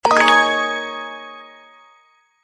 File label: turntable award